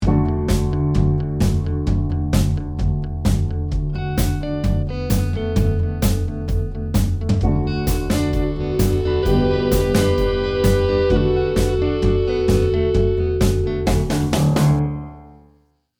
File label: MiniVox